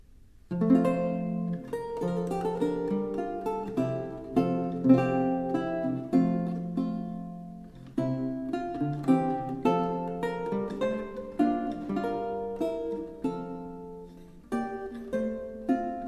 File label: A Toy (lute)